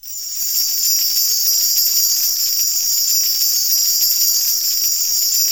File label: BELLTREE